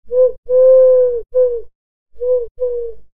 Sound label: Wood pigeon call